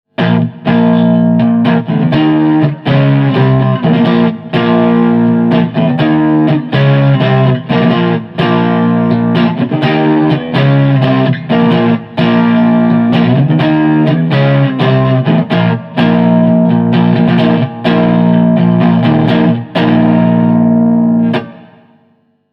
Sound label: strat boutique clean overdriven